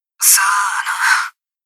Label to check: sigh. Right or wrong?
Right